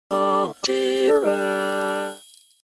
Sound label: jingle; alterra